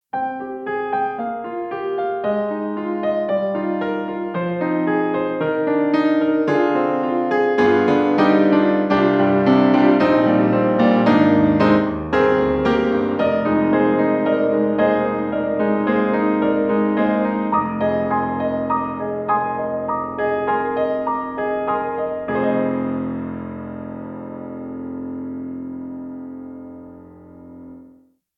Piano, Main Mic; Cinematic Studio Series

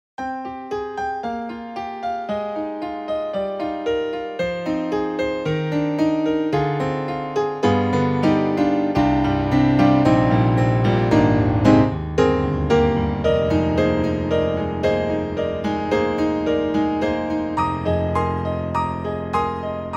YAMAHA MOTIF-RACK XS, Rock Grand Piano

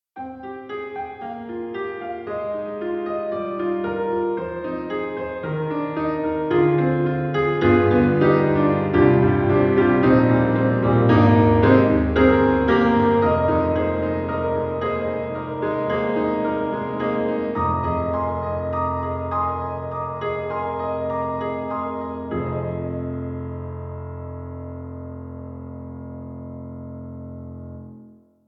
Orchestral Grands Sustaining Grand Wet; Orchestral Tools

